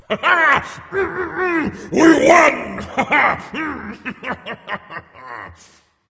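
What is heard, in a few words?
non verbal yay, voice; cernunnos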